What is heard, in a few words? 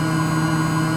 Drop Hatch Loop; Doors